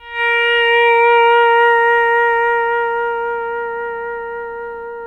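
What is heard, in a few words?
pedal steel swell A#4; PEDSTL SWELL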